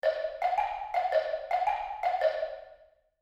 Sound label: wood block prance